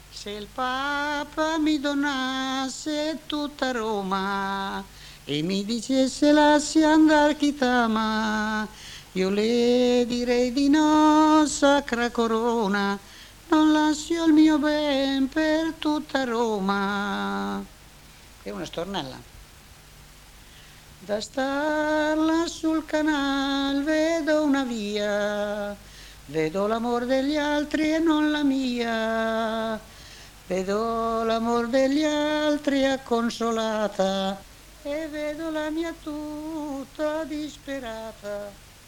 se il papa mi donasse tutta Roma (stornelli

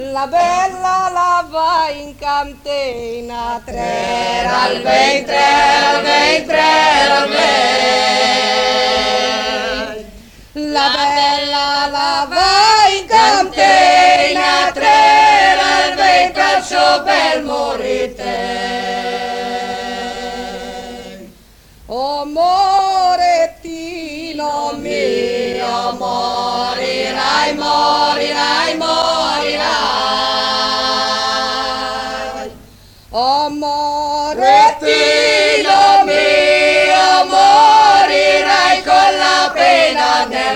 la bella va in cantina (coro donne)

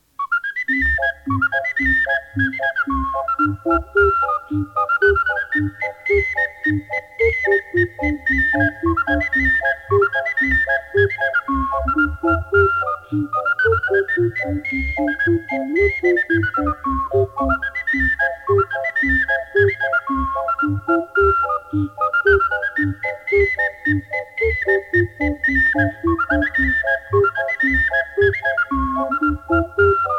polka ocarine

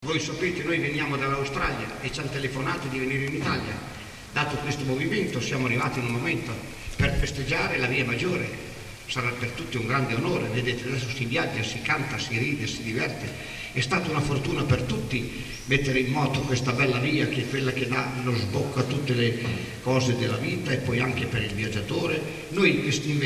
zirudella strada maggiore (live)

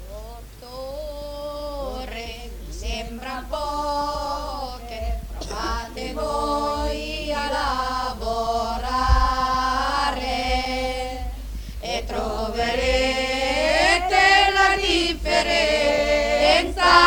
coro donne; se otto ore vi sembran poche

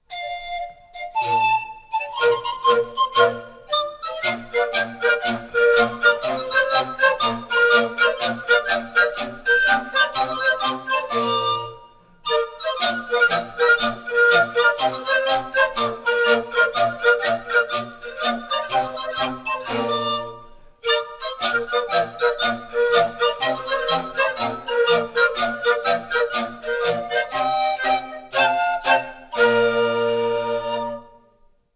flauti di pan; BRIANZA PRIMA PARTE - CANTE, CONTE, CORI, FILASTROCCHE E BANDE DI FIRLINFEU

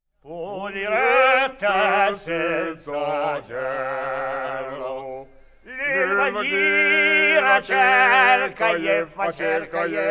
senza gerlo; BRIANZA PRIMA PARTE - CANTE, CONTE, CORI, FILASTROCCHE E BANDE DI FIRLINFEU

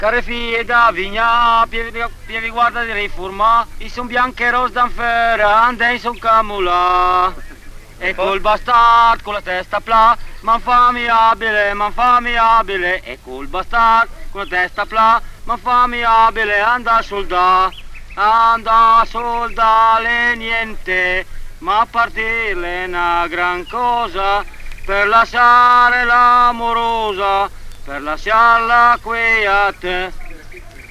strofette militari; alessandria; care fije

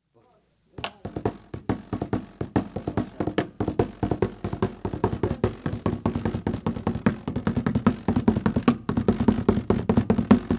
Tamburo Del Maggio; EMILIA-ROMAGNA - ITALIAN TREASURY; registrate da Alan Lomax nel 1954

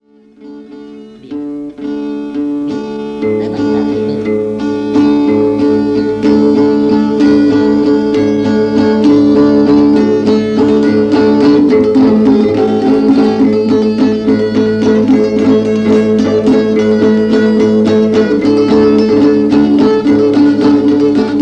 valzer chitarra a quattro mani

